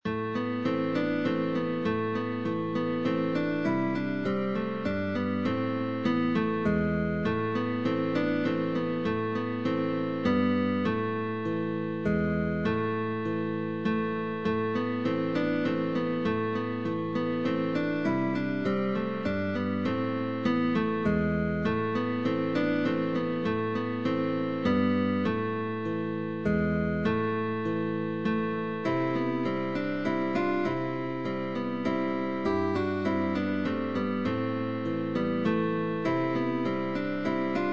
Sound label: TOURDION TRIO, guitar trio